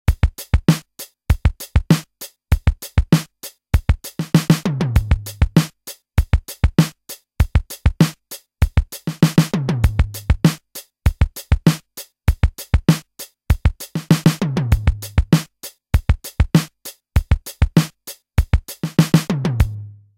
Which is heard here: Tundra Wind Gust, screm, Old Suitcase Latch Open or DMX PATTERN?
DMX PATTERN